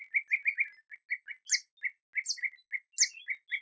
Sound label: call, bee-eater, there are three calls of a Fan tailed warbler at 1.5, 2.3 and 3 seconds; merops apiaster